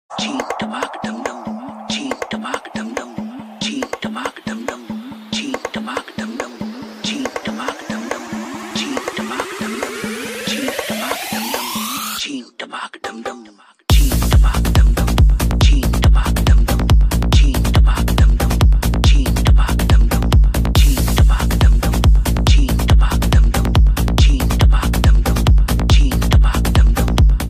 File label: funny ringtone new message notification ringtone